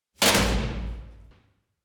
prison; Inquisitor 3

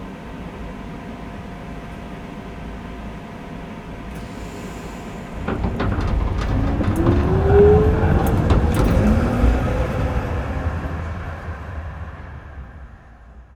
tram rolling; best service Blue Box

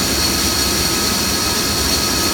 Plane Jet Idle Loop; Airplanes

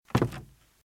Footsteps - Wood - Creaky - Walk - Left

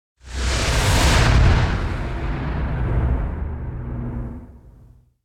Whoosh Explosion; Hollywood Edge; sound designer's toolkit v2

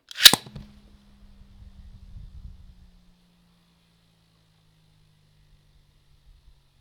bayblade carpet; devices accessories utilities; NS Studios - ultra sound collection

